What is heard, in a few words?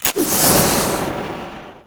Smoke Grenade Flash; PowerUps